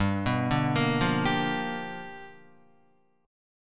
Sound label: G 7th Sharp 9th G, Guitar; G7#9 arpeggiated; JGuitar